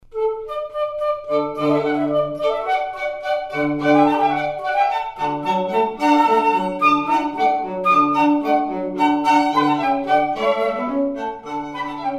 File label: Trumpet Voluntary; La Rejouissance